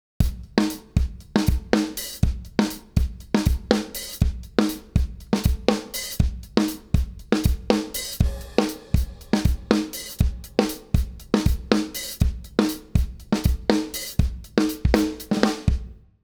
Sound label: Oldschool Drums Komp